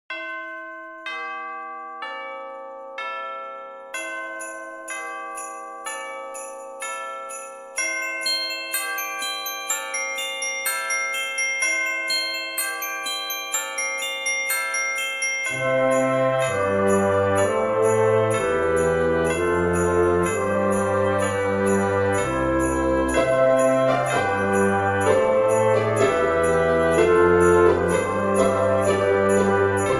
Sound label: Church bells Ringtone